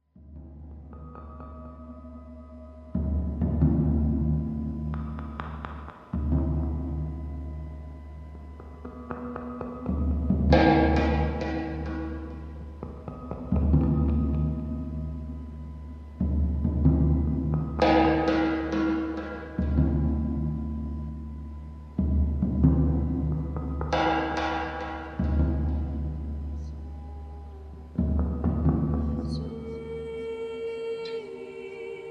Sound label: Drum Song